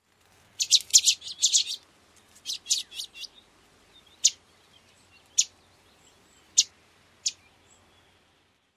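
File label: Chestnut-sided Warbler interaction calls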